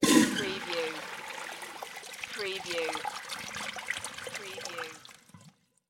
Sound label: Toilet Flush Public